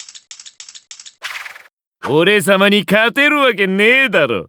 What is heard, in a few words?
victory; terumi